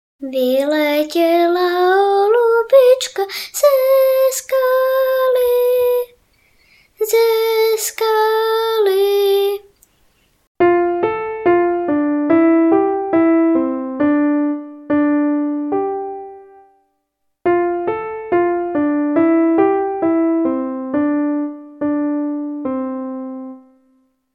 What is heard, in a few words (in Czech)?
Divci Zpev Klavir